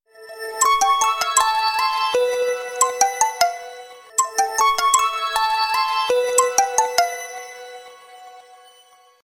sms classic nokia

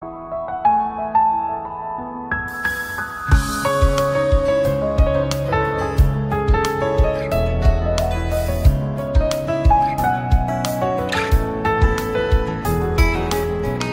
instrumental

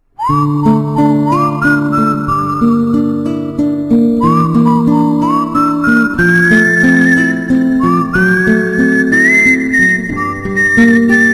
Whistling Softly

